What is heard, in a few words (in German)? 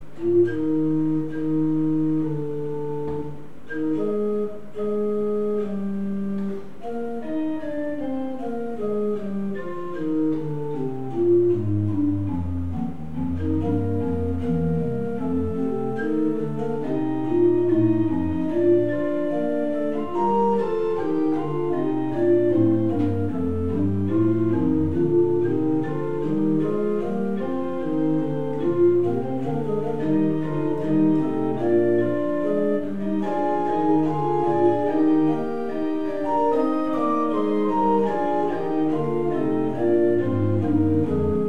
Fugenfragment Es Dur; kv 153; 26 Takte; mozart